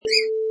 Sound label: Parakeet Sing